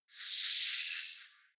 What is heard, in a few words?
sci-fi hologram message high noise; energie